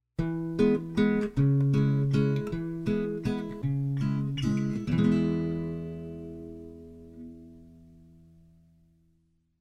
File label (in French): son isole guitare